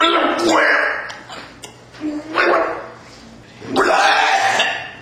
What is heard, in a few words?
dying bird full; ff hunted